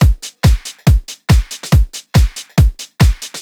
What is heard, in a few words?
Kick Loop, 140bpm